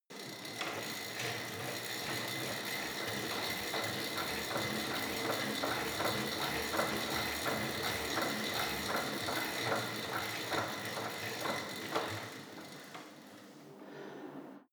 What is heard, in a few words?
indoor bike sequence medium; sport freizeit